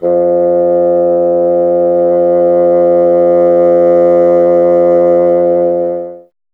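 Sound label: wind bassoon F; Miroslav Vitous - Solo Instruments VOL-1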